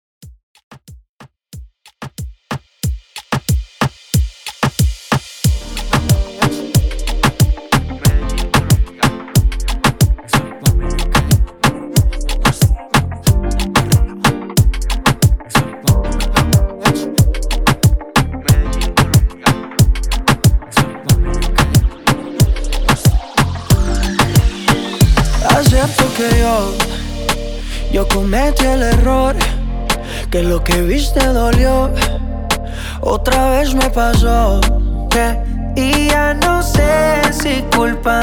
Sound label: REGGAE REDRUM 92 BPM